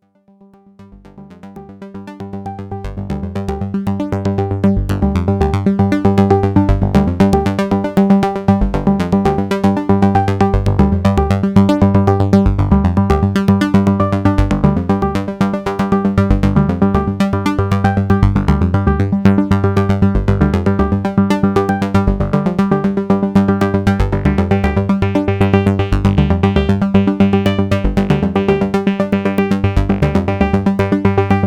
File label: Arpeggio, synthesizer; GRP A4